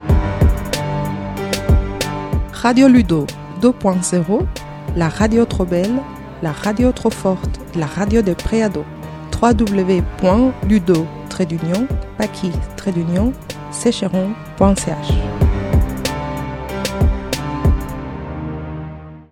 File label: Jingle